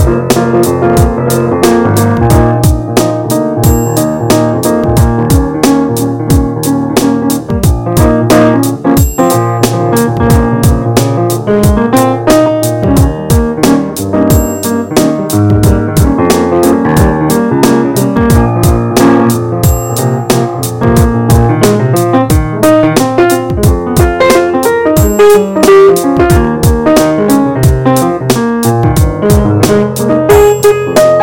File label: Blues